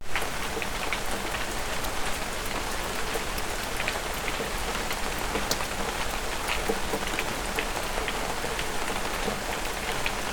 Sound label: Rain Heavy Close